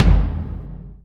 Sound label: additional kick